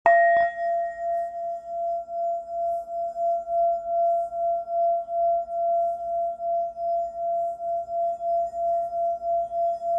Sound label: Hammered Gold F; singing bowl; Flower of Life Purple Rounded Meditation Singing Bowl – 8″D